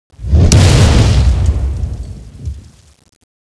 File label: smash, tentaculo; CSO KrakenBoss